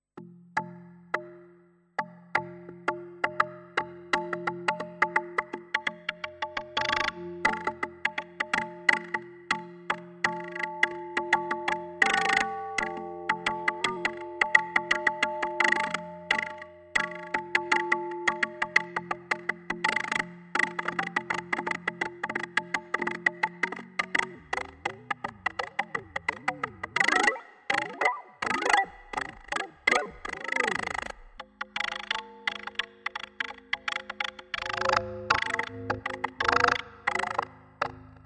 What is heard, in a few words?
Expressive E Osmose; ishango Bone